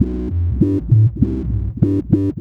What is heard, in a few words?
fuzz, bass; SWING FUZZ-1; Loopasonic